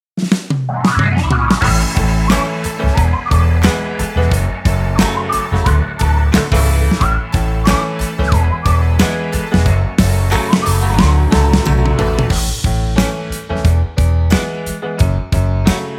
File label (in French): Chouette Playback tiefer; Dis Donc 5; Unité 1; chanson